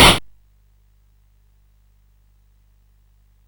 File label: sid CSG8580R5; Rescue on Fractalus